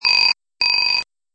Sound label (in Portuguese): Toque genial